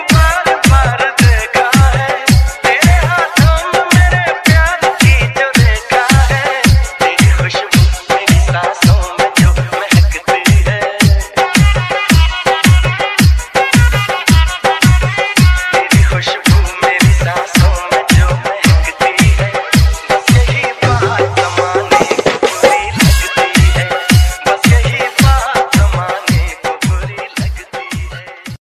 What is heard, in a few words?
bollywood dj remix ringtone